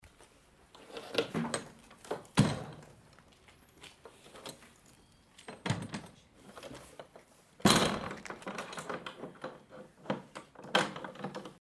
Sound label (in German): Aufbau Geraeusche; Sternsingermobil; während des Aufbaus